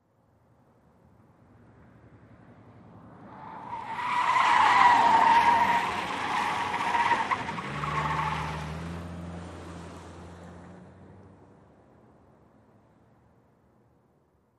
Sedan Accel Corner

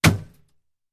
Bullet Impacts Metal

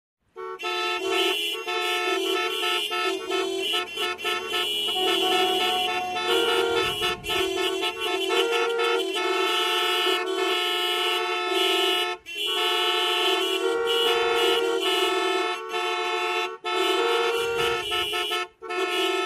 Many Car Horns Honk; multi

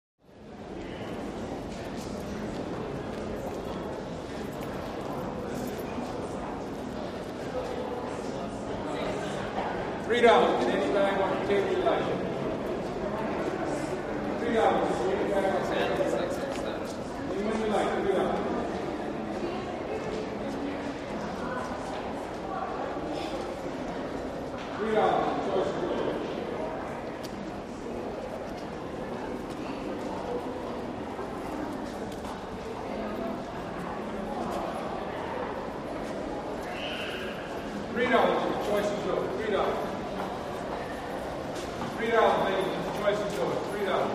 Vendor Hallway Arena, lobbys

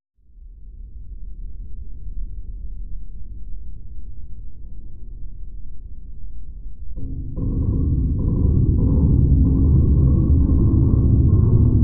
Underwater Int Low Me, metal groan atmospheres

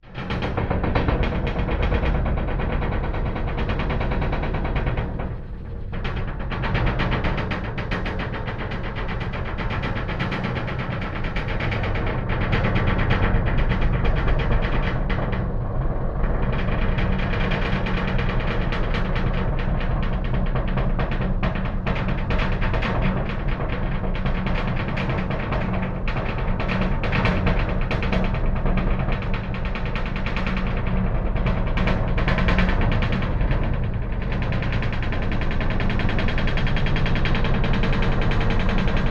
Metal Hvy Vibrate, metal groan atmos

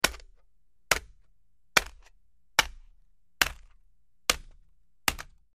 Aluminum Can Smashed

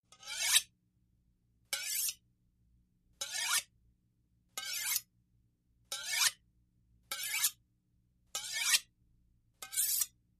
Sharp Carv Knife, utensils

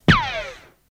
Machine Gun Silenced, silenced bursts

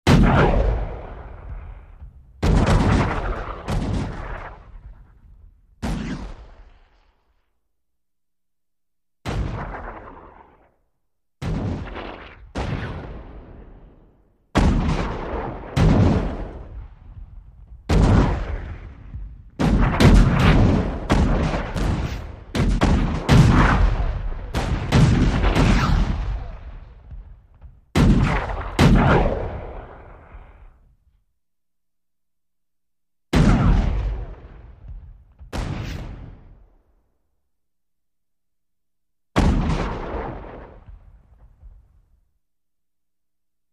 Cannon Fight Multip, shots

